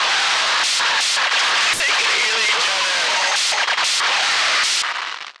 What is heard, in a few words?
radio